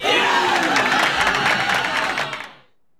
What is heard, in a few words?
GAME FULL COMBO; maimai